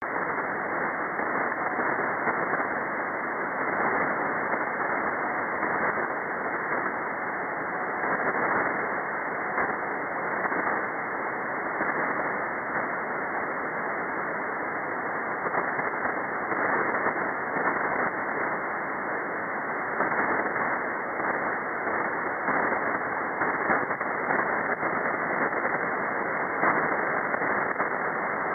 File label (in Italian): Il suono degli 80 Metri